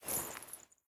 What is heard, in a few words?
armor lite; smite; lancelot